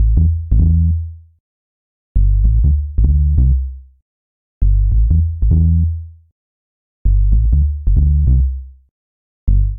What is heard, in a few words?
dirty sub bass Am 93 bpm; MIDI Clips; Bassline; Ableton; Factory Packs; Chop and Swing